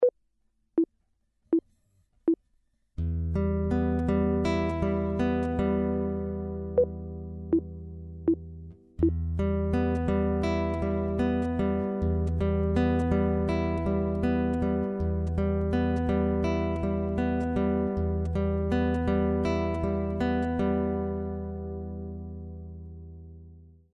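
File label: chord, guitar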